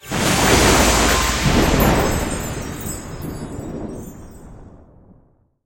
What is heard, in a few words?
win mega win; rise of maya